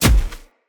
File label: Sparrow Hit Impact; Animals